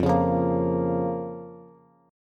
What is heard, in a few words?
F Suspended 4th Sharp 5th F, Bass